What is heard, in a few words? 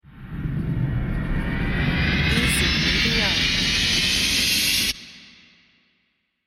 deep trailer whoosh